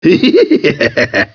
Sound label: laugh